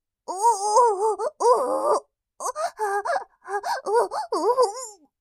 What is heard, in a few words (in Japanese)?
おぉぉぉ… おほぉ はぁっ